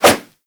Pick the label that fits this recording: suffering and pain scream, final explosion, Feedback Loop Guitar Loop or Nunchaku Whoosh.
Nunchaku Whoosh